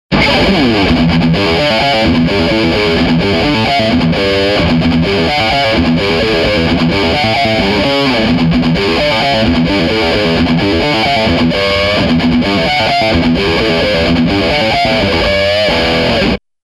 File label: Lead boosted